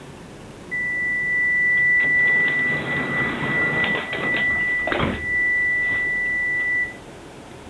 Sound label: Stockholm A buzzing sound, several seconds long, announces the closing doors; metro; wav by K. Karlsson